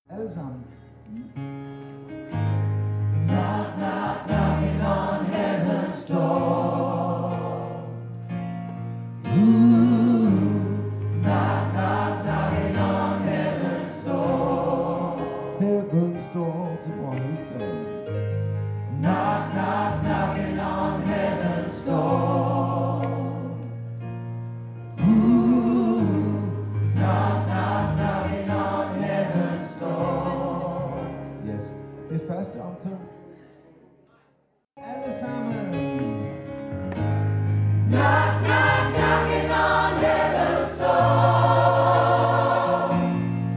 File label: alle; kor